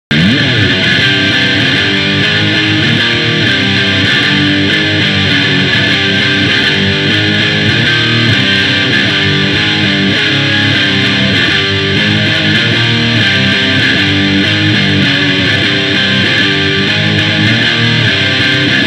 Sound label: Guitar; sabre signature 50 combo